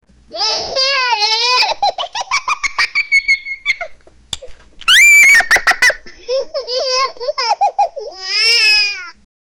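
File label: giggle laughter of a toddler; child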